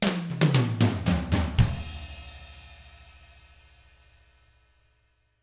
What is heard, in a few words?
Drums, R N B 6-8; ILIO; Double Platinum Drums 1